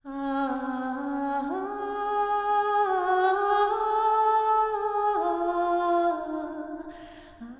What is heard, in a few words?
wakeup vocals part1 16 beats